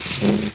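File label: Wood Slide Medium; objects; Star Wars Obi-Wan; XBOX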